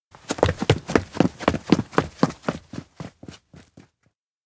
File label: steps indoor; meguriai; chiharu; anemoi パッケージ版 1.1